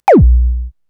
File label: ZAP, Korg EMX-1 drum machine